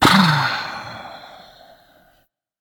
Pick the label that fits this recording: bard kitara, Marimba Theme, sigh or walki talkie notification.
sigh